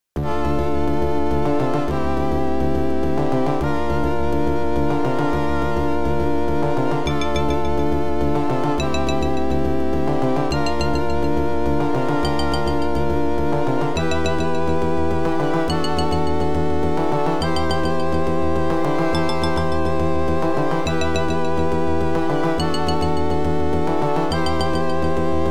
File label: OPL2; Tyrian